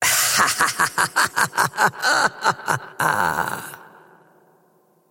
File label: wolf laugh; dota; crystalmaiden